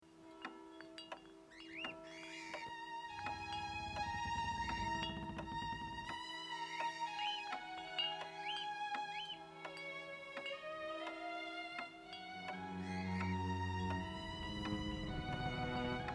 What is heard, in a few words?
Layered Melodies